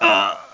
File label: HERO HIT; AB3DII